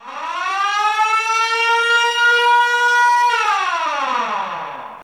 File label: webchat alert